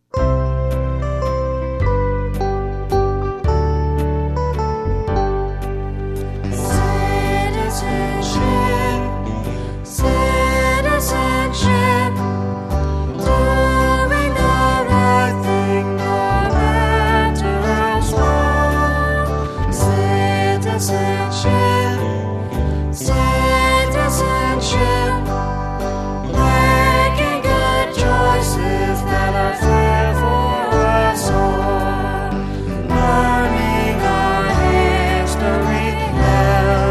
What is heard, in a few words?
Citizenship Vocal